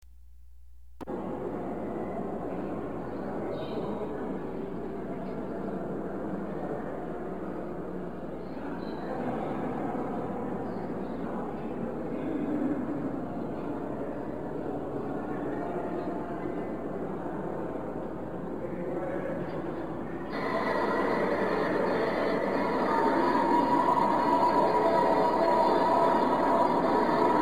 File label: fieldrecording